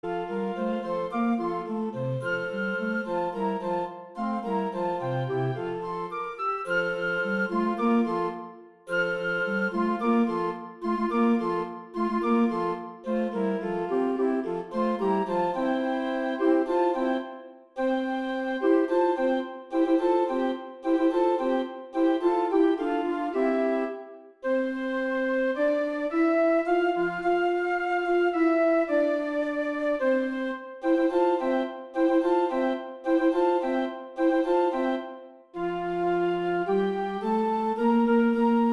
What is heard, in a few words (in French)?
Alleluia du Messie d Haendel ensemble de flûtes